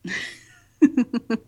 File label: chuckle